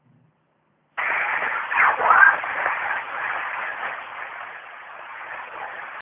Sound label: EVP